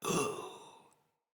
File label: Death, Battle Barks; Envoy